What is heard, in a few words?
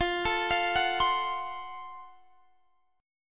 Gb Minor Major 7th Flat 5th, chord